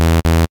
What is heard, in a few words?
error